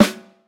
puffy acoustic snare sample g sharp key; snare drum one shots

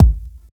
round kick single hit a key; kick drum samples

